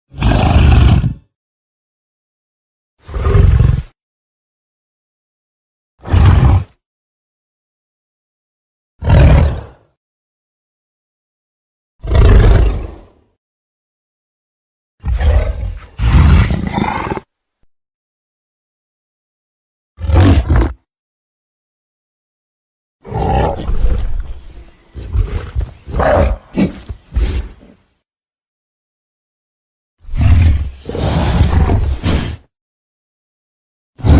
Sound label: Gorilla